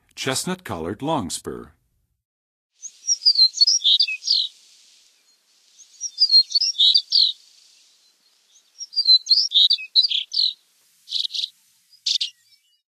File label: Chestnut-collared Longspur, Songs of Birds of North America; Western USA; Western USA Songbirds